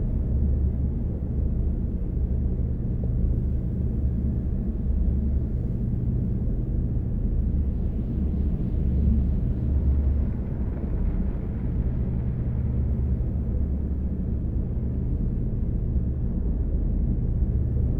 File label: titan idle; aquatics